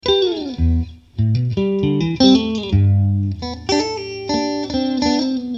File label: strat, guitar